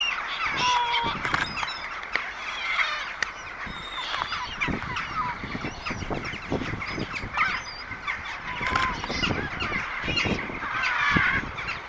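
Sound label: Pallas's gull voice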